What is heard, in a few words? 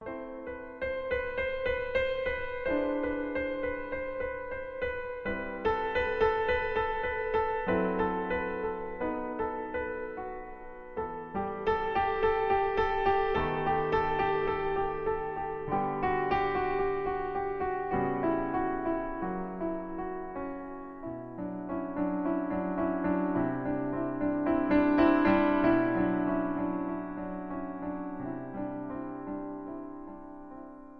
Piano Solo